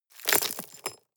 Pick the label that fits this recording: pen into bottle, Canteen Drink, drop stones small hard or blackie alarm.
drop stones small hard